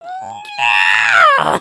collapse

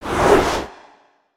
Swoop in, ui; aronwk collection; ndaudio

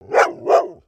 doberman bark; creatures pets

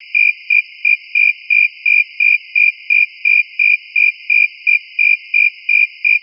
Jungle Crickets; gnarled forest

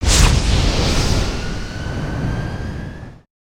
Fire Rail Start

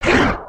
run, creatures organic

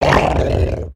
Tentacle Monster Growl; destructibles

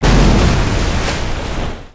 strike

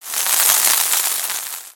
cannon fuse

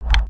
block sound; build mode

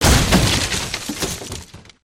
racing wood smash; destructibles

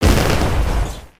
Flare Impact

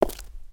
Footstep Stone Outside

